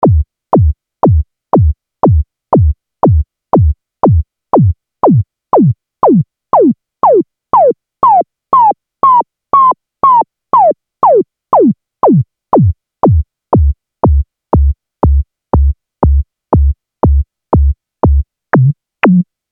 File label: SX240 reso play